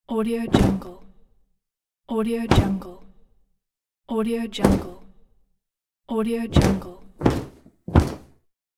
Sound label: clothes whoosh pack